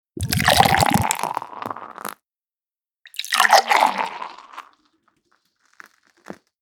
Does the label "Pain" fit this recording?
No